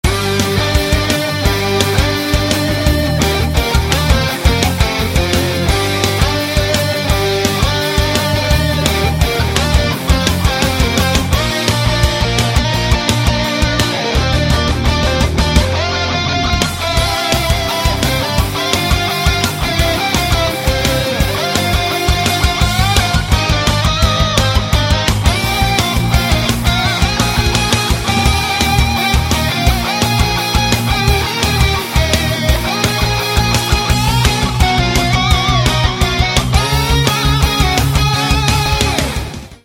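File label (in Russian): music from Dendy Денди в рок обработке; chip dale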